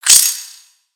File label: Spider Attack Melee; Lego; creatures mechanical